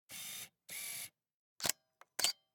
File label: Camera, Pentax KS2, DSLR, electronic, mechanical, focus, quick, snapshot; Eiravaein Works; Vaeyan IV